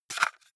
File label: cassette case insert hard; objekte; kassette